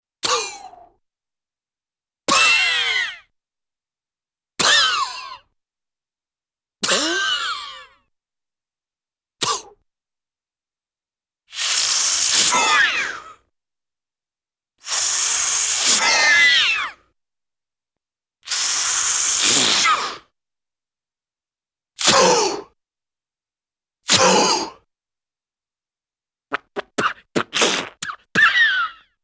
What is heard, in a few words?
Large, Breathy, Vocal Exhale, Cartoon; Hollywood Edge; Cartoon Trax